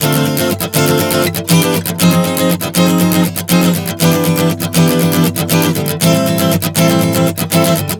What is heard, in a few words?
Dm-Fm-Em-Am 120 bpm, acoustic guitar chord progressions